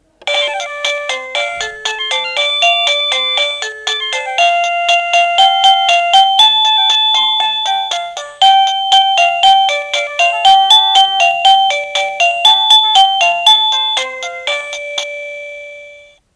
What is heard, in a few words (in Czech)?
melodie